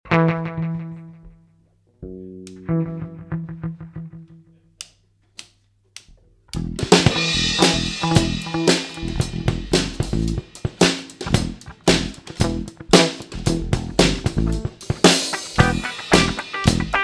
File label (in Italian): improvvisation